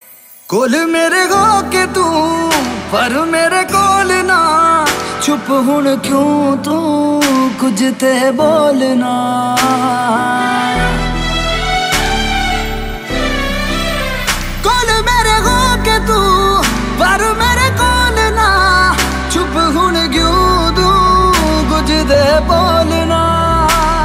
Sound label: Punjabi Song